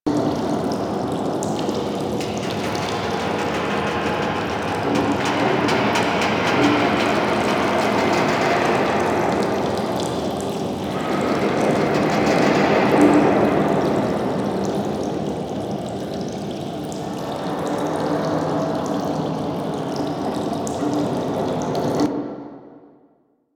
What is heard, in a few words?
Sewer Amb Loop; Warframe; The New War; Orb Vallis; Narmer Fortuna